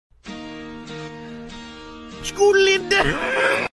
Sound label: scoodily doot; vargskelethor